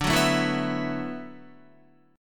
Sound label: D 6th Add 9th D, Guitar; D6add9 strummed; 9,9,9,9,11,12; JGuitar